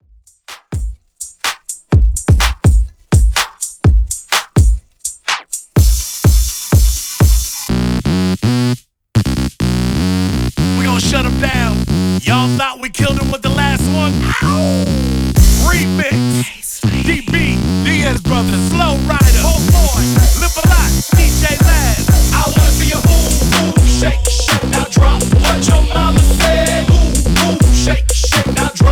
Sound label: Throwback Redrum 125Bpm